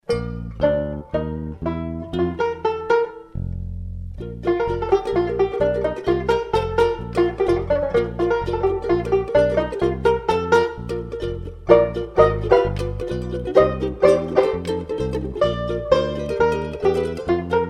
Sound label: Aria guitar banjo